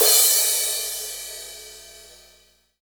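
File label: cymbal crash; Best Service Gigapack 2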